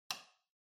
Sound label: Light Switch Box Rocker Hall Mid